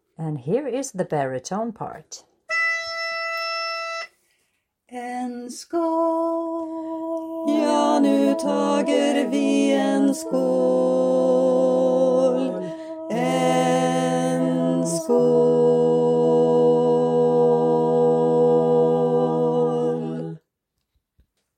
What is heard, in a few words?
Baritone